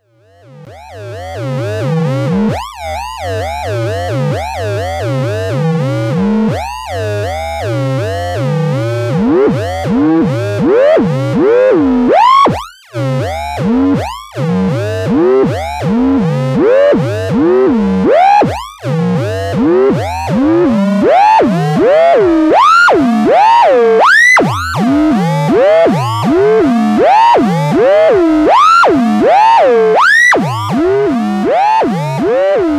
Real RM

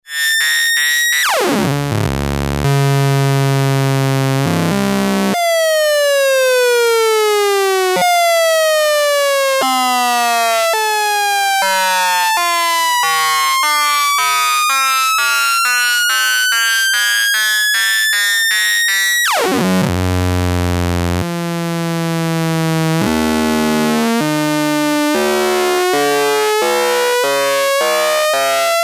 Slow Sync Sweeps

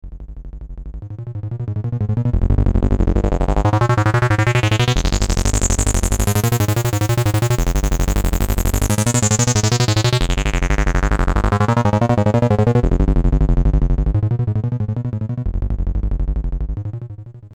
boogie; wiard

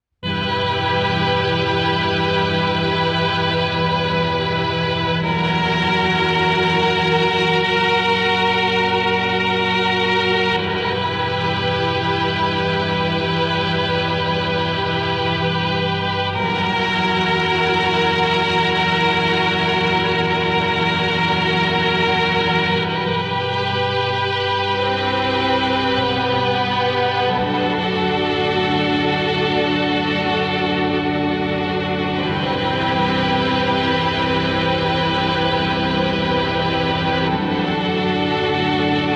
Violin mix, Mellotron MK VI

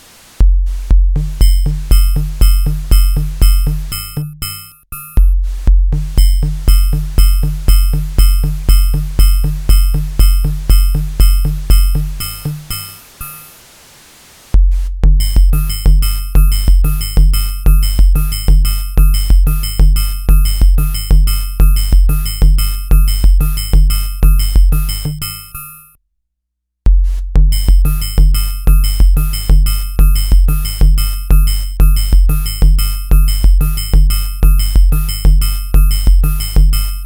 cgs gated comparator drums

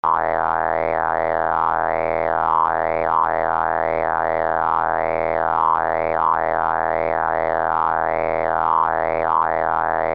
wiard boogie

